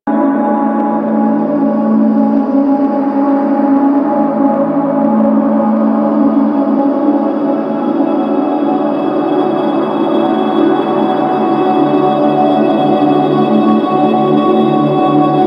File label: Hub Amb, Interior; Warframe; Ambience; Tenno Hub